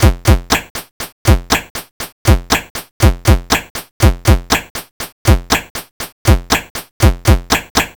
C64 Beat, chiptune drums; Commodore 64

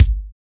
BD, Real Kit

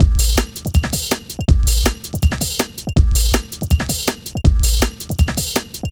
162, breaks, Loops; M8

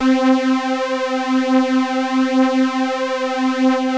PULZE FLANG, LEADS; Masterbits; Vintage Voltage

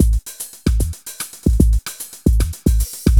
LOOP, Eurotech; Sounds Good

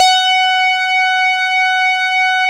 700 DUET F#3, Korg; Back In Time Records; Korg Universe VOL-1

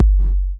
KIT HOU; Best Service Dance Mega Drums

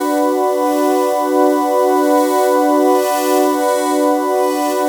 C4, SYNTH; MOONWALK; Q Up Arts; Dream Experience

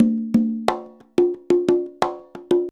CONGA 089, Percussion Loops; USB Soundscan vol.56 - Modern Percussion Loops; AKAI

